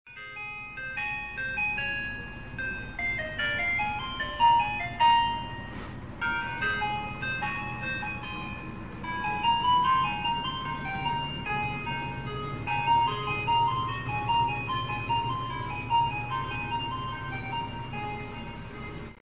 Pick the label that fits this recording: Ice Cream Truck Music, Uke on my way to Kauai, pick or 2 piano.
Ice Cream Truck Music